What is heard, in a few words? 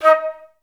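flute staccato D#4; Best Service; Peter Siedlaczek's Advanced Orchestra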